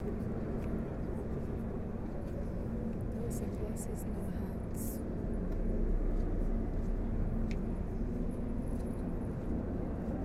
Ambience Inside Metropolitan Cathedral Of Mexico City